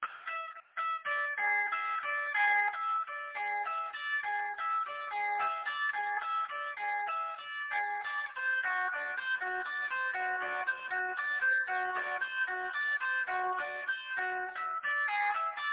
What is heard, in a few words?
fast spacey high part